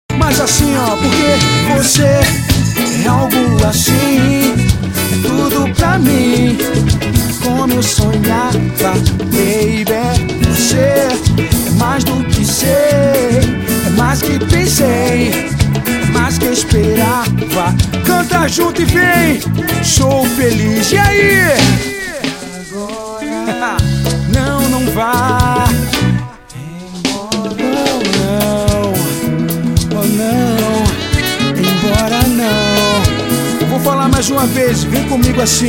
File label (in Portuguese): ao vivo pout pourri